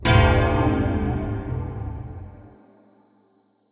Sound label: chime